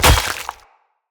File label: Dagger Impact Flesh